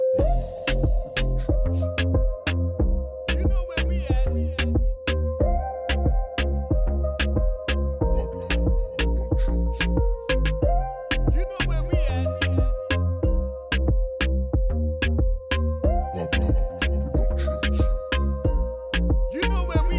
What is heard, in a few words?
Latin Reggaeton